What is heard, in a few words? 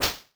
hit; Incantation